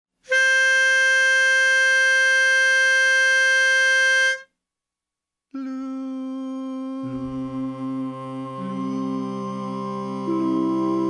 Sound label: Tenor